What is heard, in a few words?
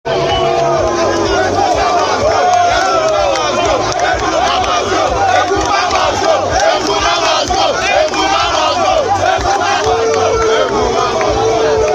Fans Chanting Eguma Must Go